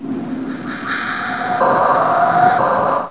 alert, monsters, screamer; AoMClassic

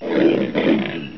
idle, monsters, screamer; AoMClassic

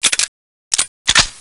deagle reload, weapons; AoMClassic

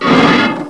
attack, monsters screamer; AoMDC

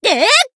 charge shoot; player; playersounds

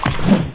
attack, monsters, handcrab; AoMDC

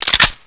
beretta clip in; AoMClassic

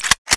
ak47 bolt pull; weapons; AoMClassic

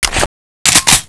shotgun draw, weapons; AoMClassic

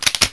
beretta reload; AoMClassic

